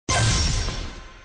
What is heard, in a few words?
select Success; LinkGame; LuaDemo; Gaming